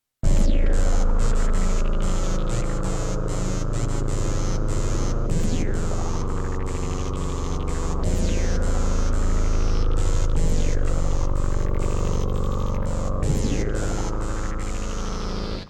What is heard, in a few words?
Hard synth intro